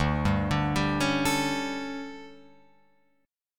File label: D Major 7th Suspended 4th, Guitar, Open F; DM7sus4; arpeggiated; JGuitar